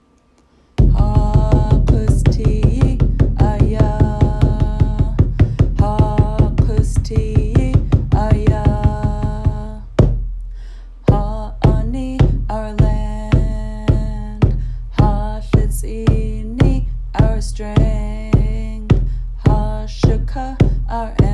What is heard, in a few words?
chorus